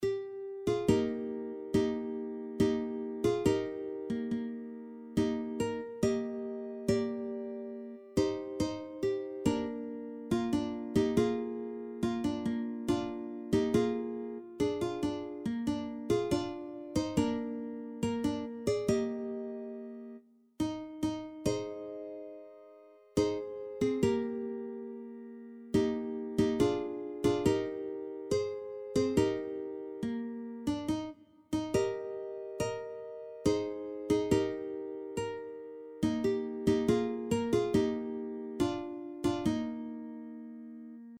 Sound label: Sleepy Rasta Melody